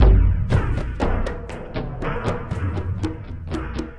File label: Conga Swish, Drums; Sonic Foundry (Sony Creative Software); Friendly Fire; Marc Steve